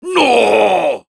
negative vocalization; Soldier

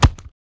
chest hit; Taunt; yeti

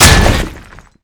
charge hit flesh

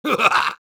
laugh evil

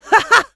Scout laugh short fr

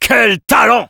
positive vocalization

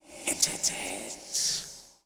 Sword idle

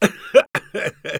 Engineer laugh short, es

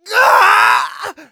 pain severe, de; Scout

